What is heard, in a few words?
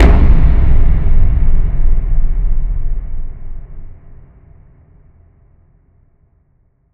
lose; Untitled Maze Game